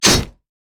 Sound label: basicattack; pantheon